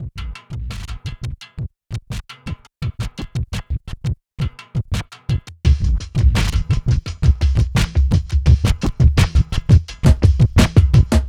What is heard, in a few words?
drum